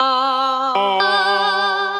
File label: Transformed Voices; A Day in the Country; Voice Box; Chromatic Voices